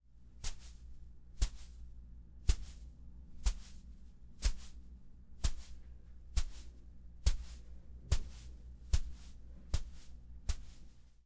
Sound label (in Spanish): pasos personajes